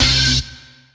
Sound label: dance chord